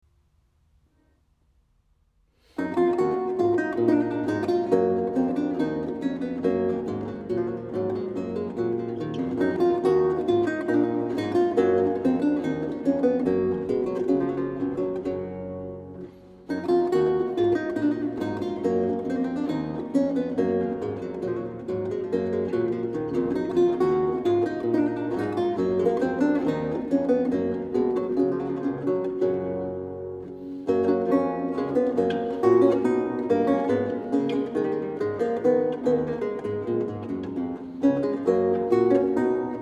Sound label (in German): Bach Bourree e-moll BWV 996